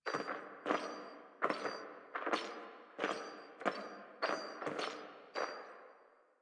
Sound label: Courtyard Intro Foley Distant Steps; Cinematics; Dax; Warframe; Duviri Quest